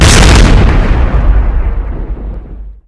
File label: rocket boom; explo